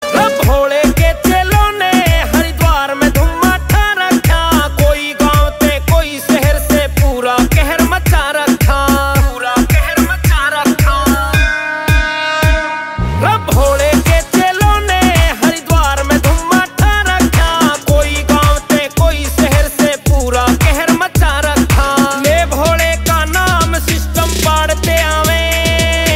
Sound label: Ringtone